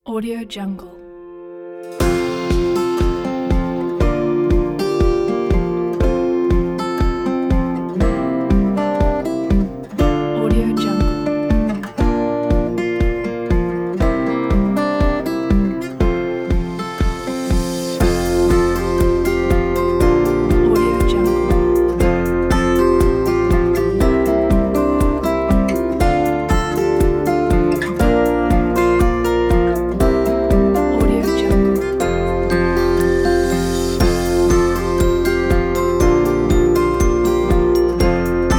folk acoustic inspired; papoom8

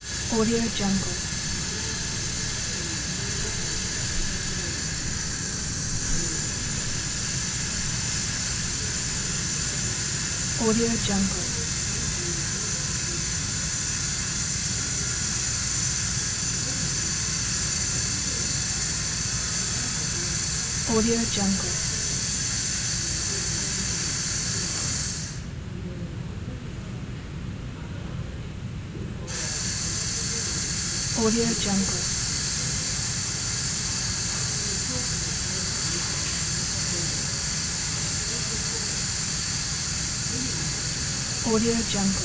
construction ambience close tokyo; omnibitsd

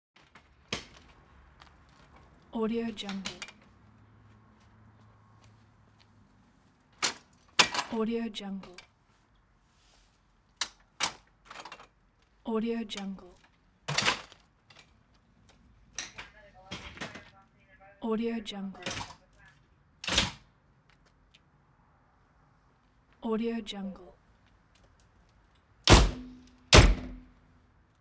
medic putting stretcher into emergency vehicle

